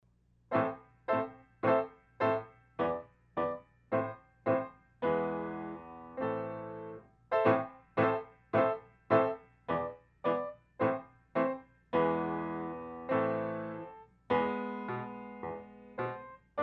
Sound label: Russian Sailor Dance